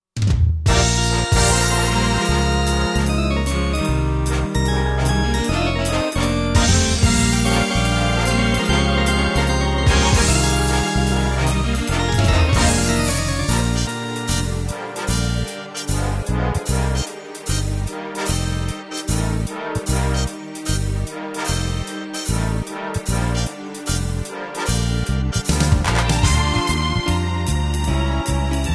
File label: brass minus